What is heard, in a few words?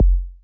Sub Kick, Hip Hop